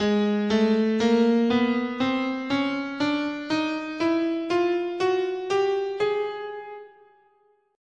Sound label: G sharp Chromatic, scale